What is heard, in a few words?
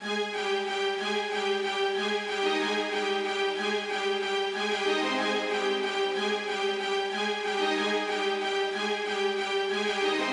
Violin Melody 93bpm